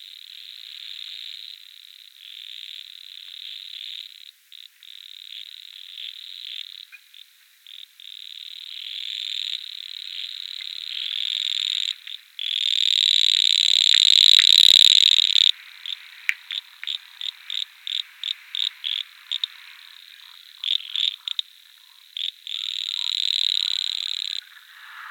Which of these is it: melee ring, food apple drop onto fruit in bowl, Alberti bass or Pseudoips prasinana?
Pseudoips prasinana